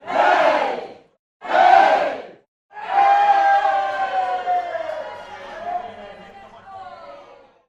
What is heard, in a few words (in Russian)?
zvuk hey muj tolpy